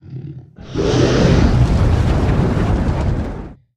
Dragon Roars Breaths

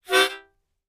Mouth-organ, Short Car Horn, Type 1 - Single; woodwinds harmonica

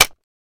Mouse Trap Snap

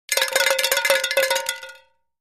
Rattle Can Head Shake

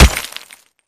Concrete Crash, rocks & dirt

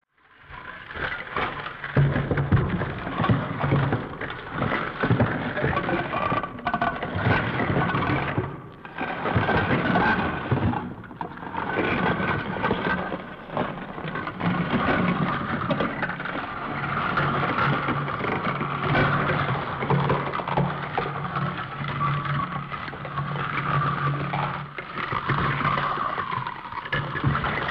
Ice Cracking Rubbing

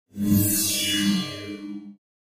Stab Digital Shimm

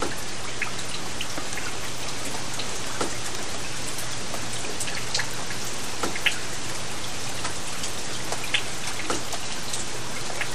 Rain Light On Lake Loop

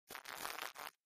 Insect Buzz Shrt, wings

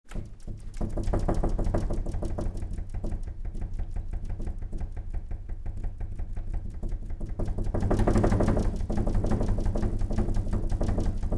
Rattles Wood Window, wood stress

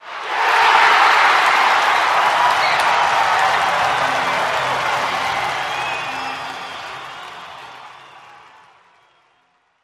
Crowd Yeah Big Cheer

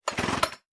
Chain saw chord being pulled and released.; Tools, Hand Wood, Sawing Saw, Chain

